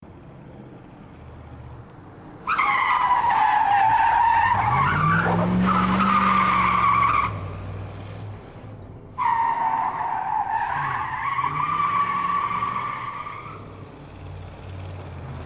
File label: muscle car driving skid out